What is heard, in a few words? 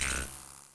Kar98K, weapons; cstrike